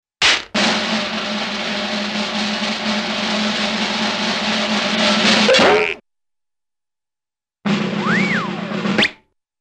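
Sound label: Snare Drum Roll with double 'boop'-like Sound - Slide Whistle, cartoon; Hollywood Edge; Cartoon Trax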